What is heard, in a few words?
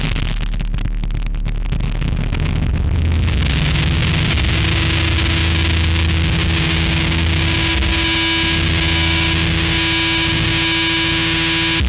SUBSTATION, Funk Guitar; Zero G - Funk Guitar